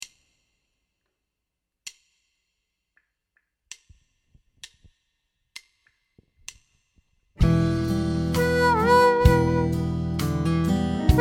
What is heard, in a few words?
Em 65 harp